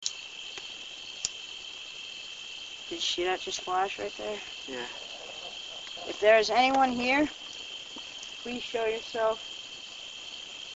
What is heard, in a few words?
laugh